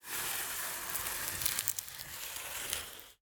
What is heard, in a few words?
dragging full box package